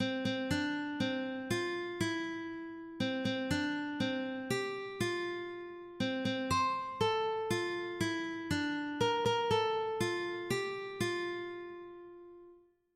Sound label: birthday, ukulele